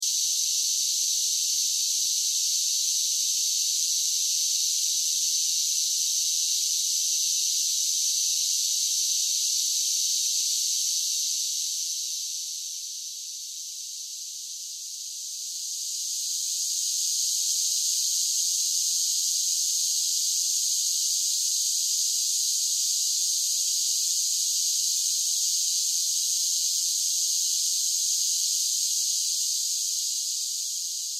Cicadas Sing, Cicadas, Sing, Song, Buzz, Large, Groups, Singing, Insect, Bug; Sound Effects:Animals:Insects